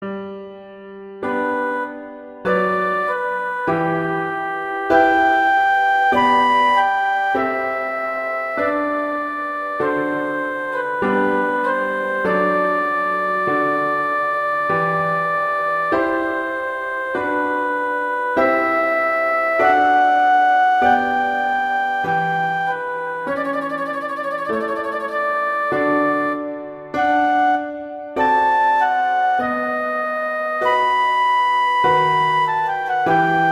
Adagio; Sonata; Handel

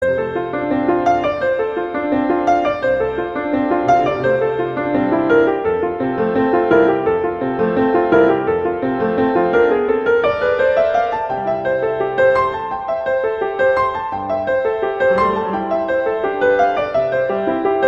in F major, Moderato; Chopin; Preludes

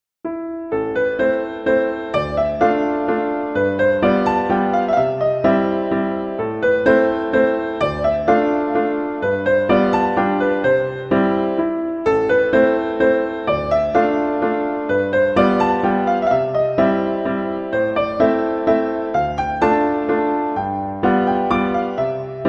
Waltz in A minor; Chopin; Waltzes; Op posthumous

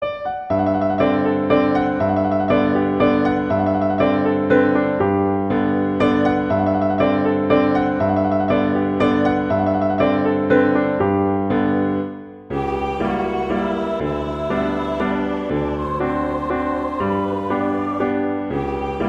Allegro ma non troppo; Chopin; Maiden Wish

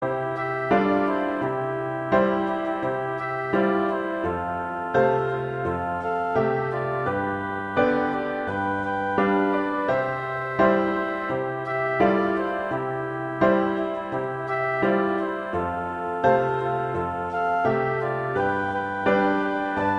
Fl Pf; Christmas; Jingle Bells

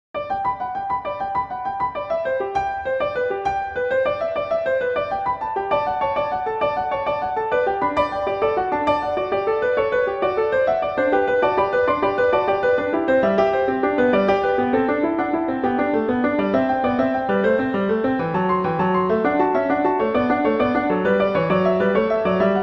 Gigue; Bach; French Suites

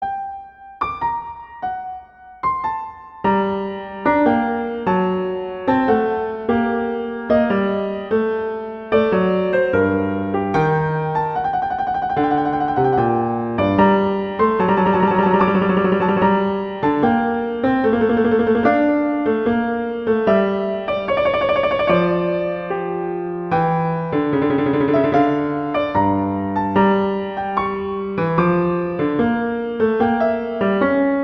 Adagio; Telemann; Canonic; Sonata 1

